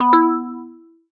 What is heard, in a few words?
menu click; Rock Papper Scissor